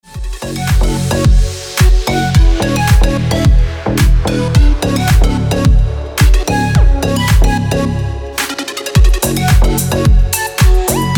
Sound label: melodija na mobilu the armenian duduk is crying